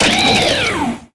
Grito de Genesect

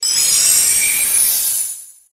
Grito; Frosmoth

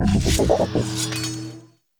Grito de Kingambit

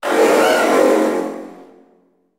Grito de Mega-Garchomp Z

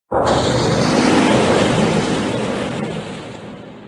Grito de Toxtricity Gigamax

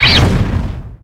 Grito de Larvesta

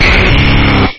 Grito; Charizard

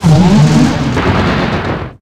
Grito; Thundurus; grito en la sexta generación (XY)

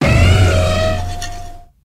Grito de Colmilargo

